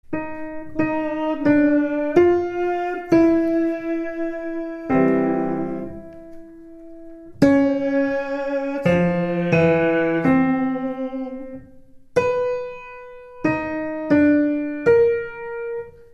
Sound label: Tenors; Salve Regina